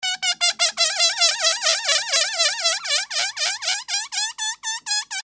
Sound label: ballon squeek seq